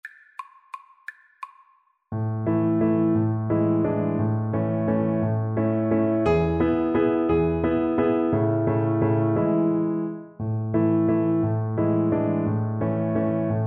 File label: german dance violin; schubert